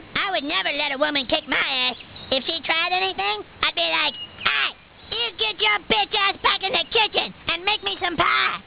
pig

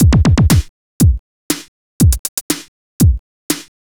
techno

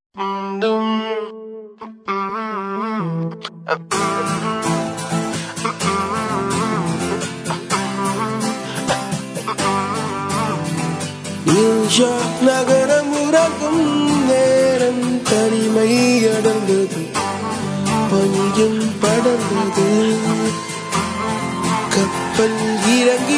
ring new

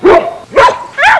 barking

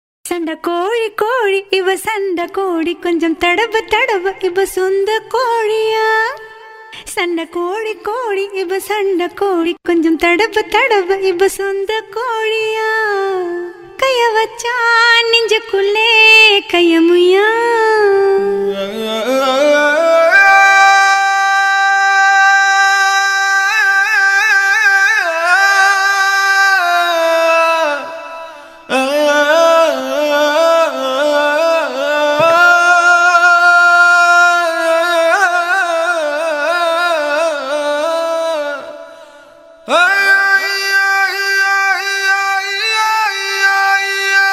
Alaap Vocals; rainbow swap

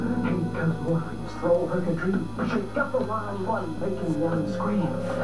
chant

